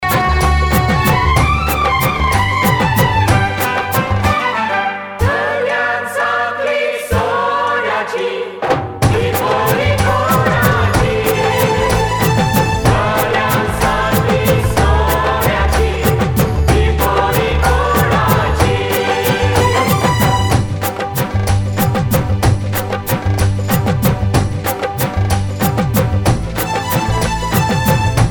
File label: Female